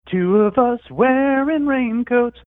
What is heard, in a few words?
singing two of us wearing raincoats